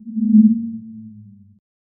low descending tone with

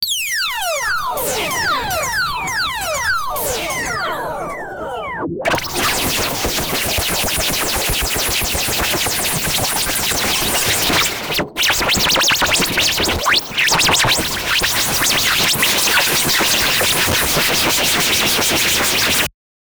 i just want a fire, burning

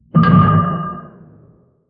a metallic collision; destroyed

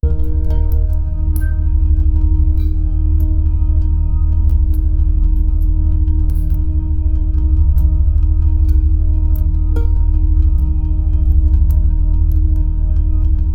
calm engaging science doc, electronic pulses

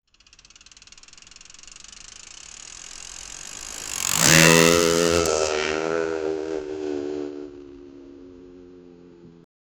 sound effect of an old motorbike

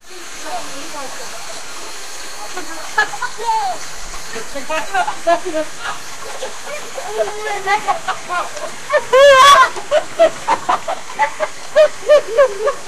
a 27 year old father, play

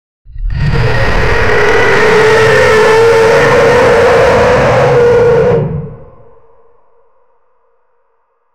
a highpitched dinosaur ro

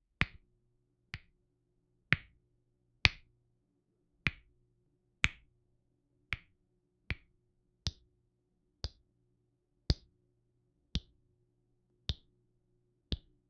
tapping of fingers on the, table

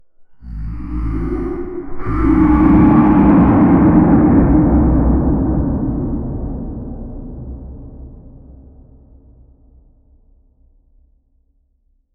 a deep echoing roar, beast

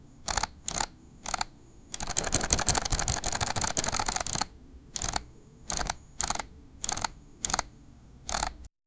card distributing sounds

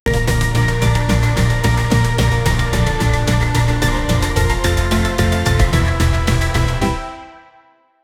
short action filled beat

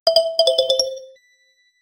a short silly cartoon reward

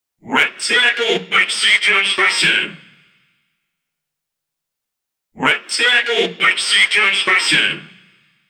vintage boxing ring, announcer; 1930s vintage boxing ring

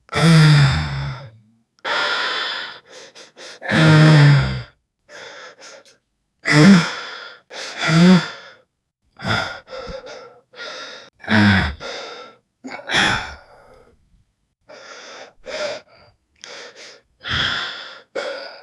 years old man holding, mouth closed; 18

